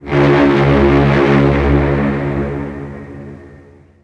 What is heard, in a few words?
bridge boat; Mafie 1